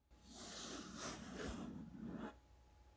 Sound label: Toolbag rubbing